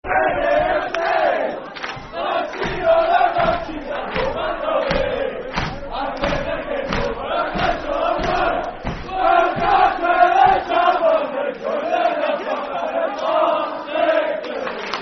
We've Followed The Thistle For Many A Day Football Chant (Soccer Song); Partick Thistle Ptfc